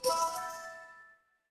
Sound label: feedback success